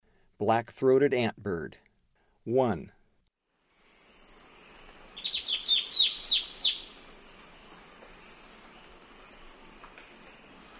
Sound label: Black-throated Antbird; S. America; Eastern Lowlands